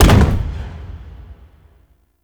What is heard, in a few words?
Infested Golem Step Default; Warframe